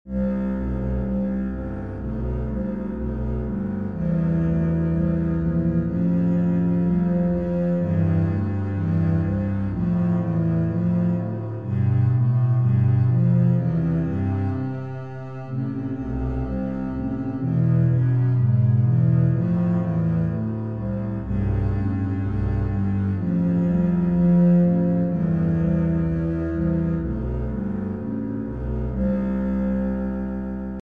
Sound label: bass c major scale duet